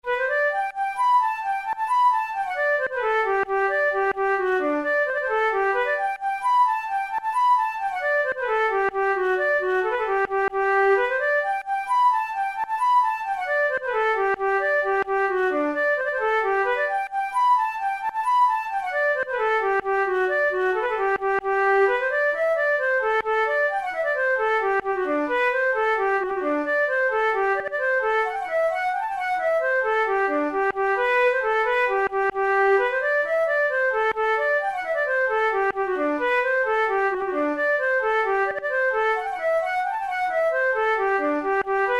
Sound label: jig, flute tunes